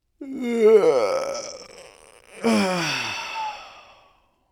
Human Male Yawning And Stretching

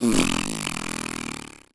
Human Male Zerbert Raspberry

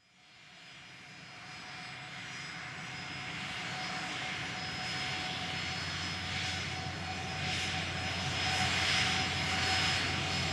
Airplane Jet Idle Drive By On Runway; Transportation

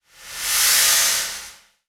Transition Whoosh Short; Production Element; Title Transition; AdobeCC

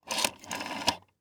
Household Phone Dial Rotary Three

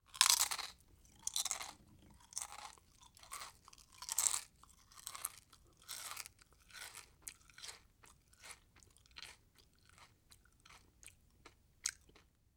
Human Eating Potato Chips

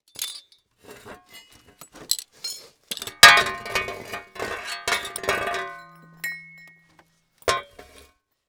Industry Machine Working On Machine

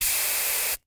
Household Hair Spray Can Spraying Long

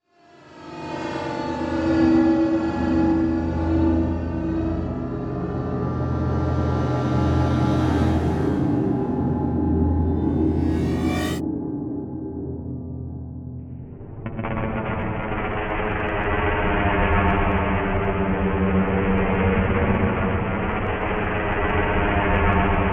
Slow Drone Tension Spooky; Module Production Element Title Imaging; Imaging Elements

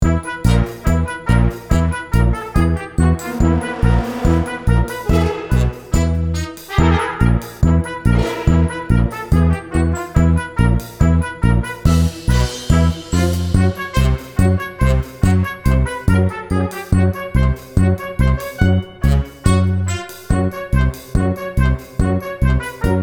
Circus Tent; Incompetech

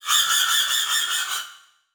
Title Transition Horror Dark; Production Element

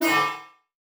Multimedia Internet CD-Rom Flash Ding

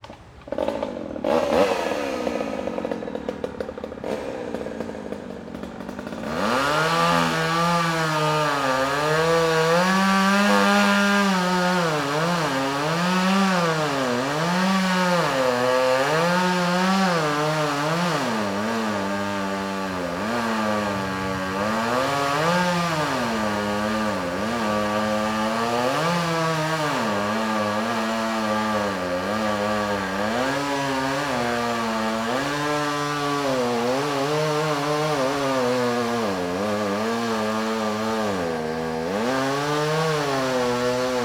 Industry Tool Saw Chainsaw Cut Tree

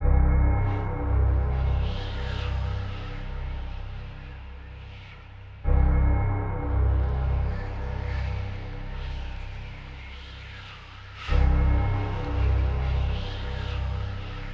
Haunted Soundscape 85BPM; Soundscapes; Cinematic Trailer Music

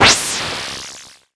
Title Transition Blast Energy; Adobe; Production Element

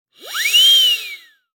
Cartoon Whistle Motor Whistle Long; AdobeCC

